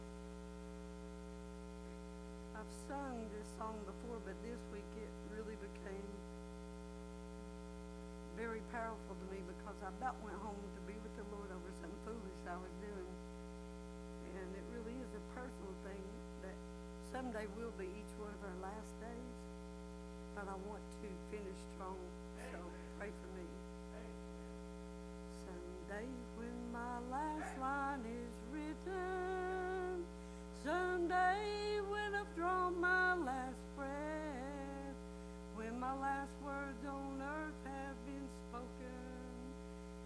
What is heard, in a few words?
singing